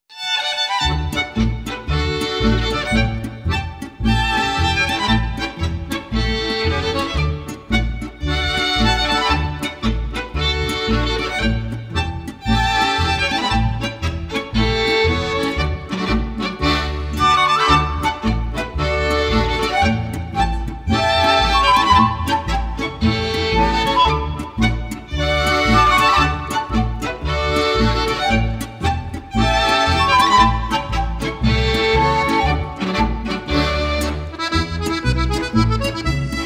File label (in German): jubilaeumspolka geigen